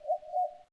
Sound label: Desert exclamation; 250 Desktop Themes